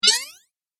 Game Click Or Jump, Video Game Sound Effects

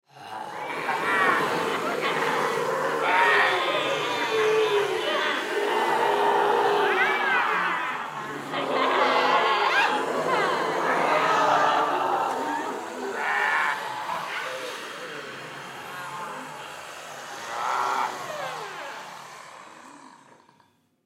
Human Crowd Studio; Crowd Reactions Monster Noises; Blastwave

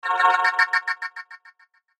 Sweeper Bright Musical Delay Short; Production Element Imaging Element Accent Transition

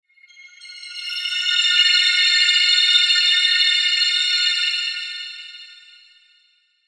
Shimmer Musical Ascend Swell; Ascending; Blastwave

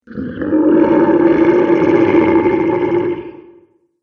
Dinosaur Creature; animal; Dinosaur Sound Effects

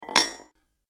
Impact Hit Clank; Miscellaneous Impact Sound Effects

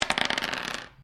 Dice medium pair laminate table throw; Casinos; Variety of dice sounds; SoundEffects Foundation2

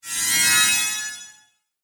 Fantasy Mage Spell, magic; Magic Sound Effects

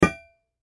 Impact Metal Bar Hit Dull Drop Heavy; Metal (Sound Effects:Materials); Blastwave

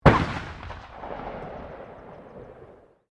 RIFLE UNKNOWN FIRING DISTANT; Rifles, non military; Guns

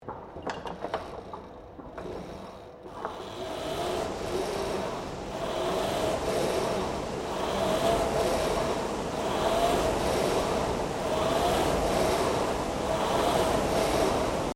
GYM ROWING MACHINE START; Various gym machines; Equipment; Sports

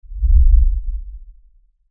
Lfe Rumble By Swell Low Bass Movement; Rumbles; Blastwave; Sound Effects:Sound Design

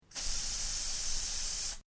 Aerosol Spray, Spray can hiss; Spraying Sound Effects; Objects

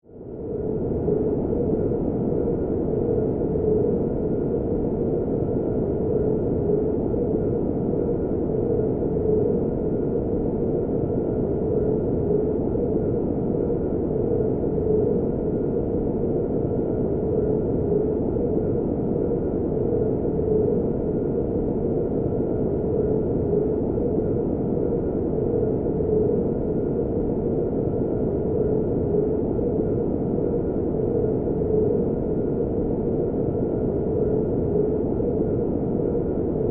Drone Wind Alien, Planet, Steady, Scifi; Science, Fiction; BlastWave

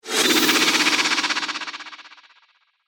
Horror Chopper Snake Hiss Eerie; Scary Soundscapes; Production Element

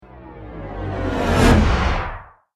Cinematic Reverse Whoosh; Whoosh Sound Effects

